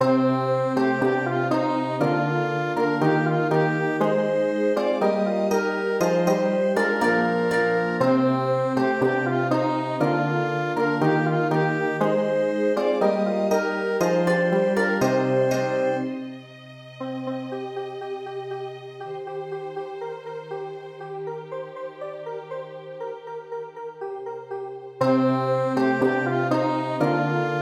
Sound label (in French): ALLELUIA CONDUCTEUR; orchestre; ALLELUIA "Colombière"